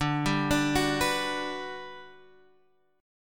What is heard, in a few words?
G Major 7th D